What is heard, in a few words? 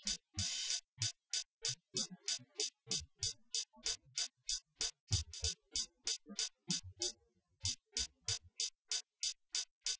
wdrums; SiSEC08; SiSEC underdetermined